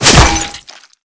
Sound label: headshot